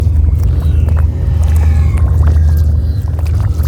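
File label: tormented soil loop; morgana; fallenangel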